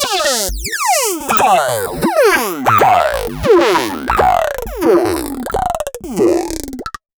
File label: Abstract Synth, EDM; Sonic Foundry (Sony Creative Software); Afterhours EDM; Ibiza Underground